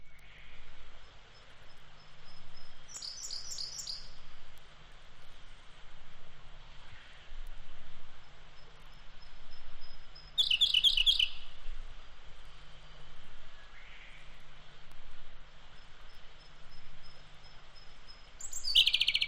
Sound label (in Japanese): 野鳥のファンタジィ, field recording 高尾山収録 自然のうたごえ